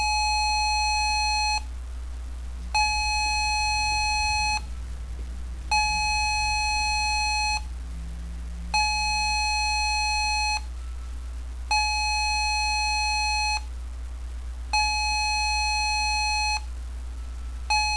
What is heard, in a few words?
ALERT, Tones; CivilProtection